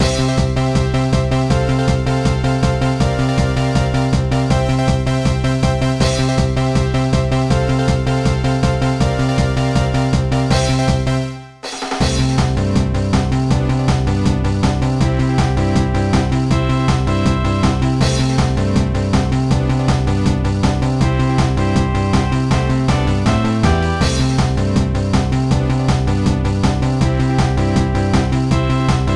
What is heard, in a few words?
Rival Stage Karaoke; pinky street kira kira music night pop town gamerip